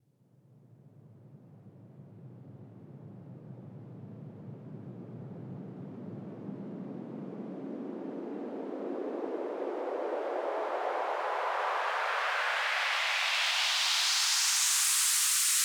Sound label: SweepFx 138BPM; Sound FX; A#minor; Vengeance Samples; Fusion Kit